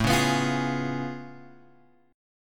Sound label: A Augmented Major 9th A down Guitar Open F; strummed